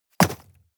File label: shovel dig impact short